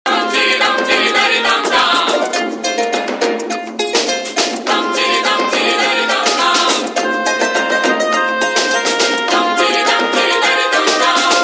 PHONK

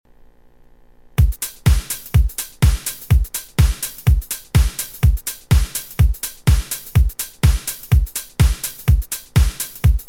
House Mix

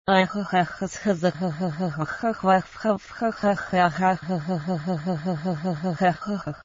Ahahahahah; Golos Google

